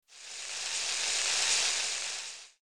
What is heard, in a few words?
element water; maoudamashii